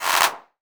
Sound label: CLAP FX